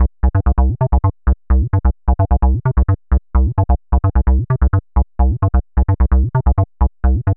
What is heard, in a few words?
130 C bass, bass loops, trance; Trance Explosion Vol1